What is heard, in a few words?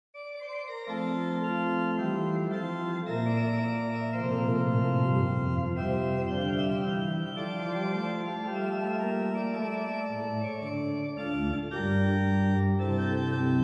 Clavecin Courante; Souvigny